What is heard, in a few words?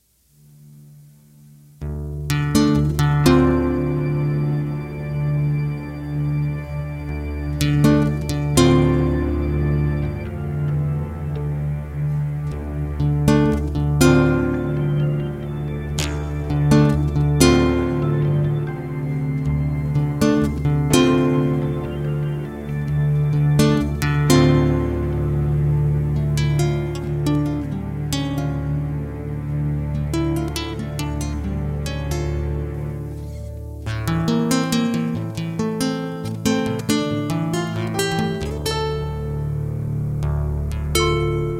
Guitar Keys